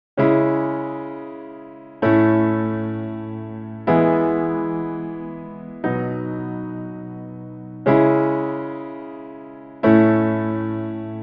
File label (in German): moll; popformeln